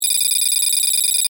Data Beeps Readout, Scifi; Tech; BlastWave

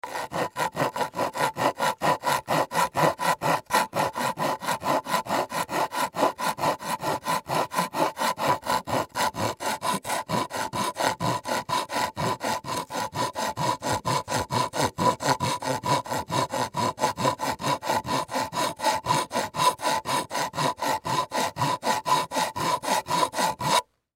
Wood Rasp Scrape Finished Wood Fast Pace File; Hand Tools; Blastwave

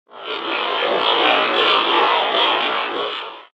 Evil Androids; Evil Sound Effects; horror; science fiction sound effect; Andrew Fleming

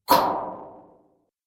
Magic Air Swipe; Magic Sound Effects; Andrew Fleming; Twistedtracks

